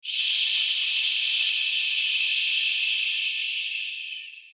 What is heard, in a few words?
Shush